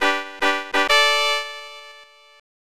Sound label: Quiz Fanfare; captain novolin; snes